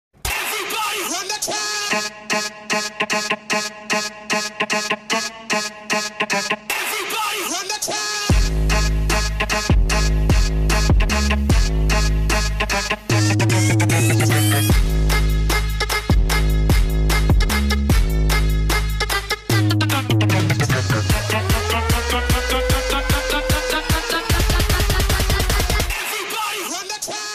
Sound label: trance edm music ringtone